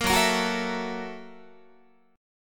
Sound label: F sharp 7th Sharp 9th F sharp down Guitar; F#7#9; 13,14,13,16,13,15; strummed